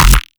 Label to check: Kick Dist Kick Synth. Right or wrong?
Right